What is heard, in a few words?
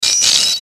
static crackle; Static Crackle Ascend; Production Element Imaging Element Accent Transition; Blastwave